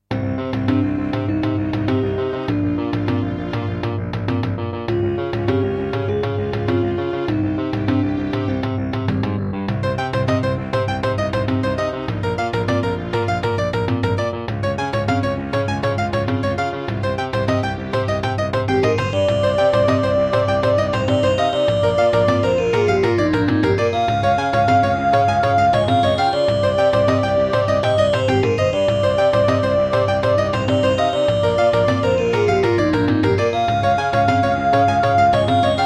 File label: Diamond Monster Sound 0.5MB; Hocus Pocus